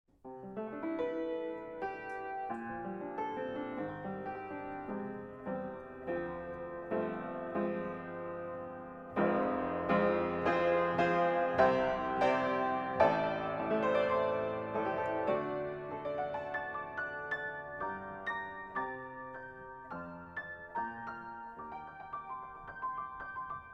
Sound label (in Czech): Pianino; Recenze SE 5